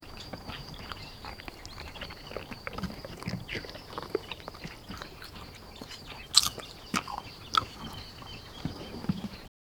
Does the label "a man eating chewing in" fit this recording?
Yes